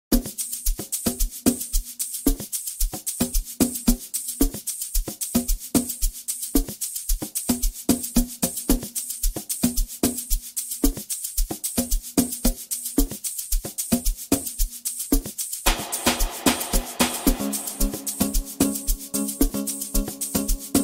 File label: Saxified